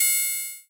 laser gun short; like gradius3

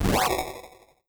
great sword slash lowbit short

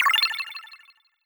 button cancel

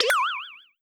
exclamation mark

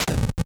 axe hit lowbit

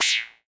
standard shot long

